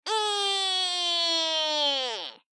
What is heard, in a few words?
armadillo howl